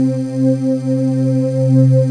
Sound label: PAD nice Pad; Best Service Gigapack 1